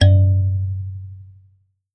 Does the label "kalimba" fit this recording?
Yes